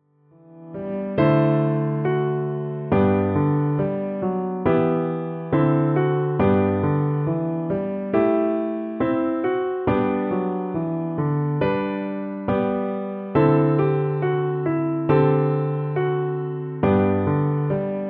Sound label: air